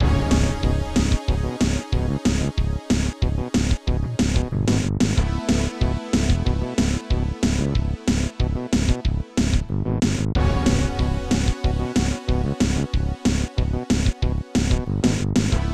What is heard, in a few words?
Pause Menu; NFL Quarterback Club; SNES